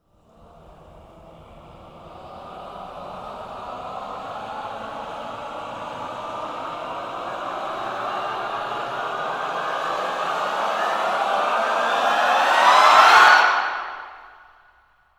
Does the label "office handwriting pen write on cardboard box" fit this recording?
No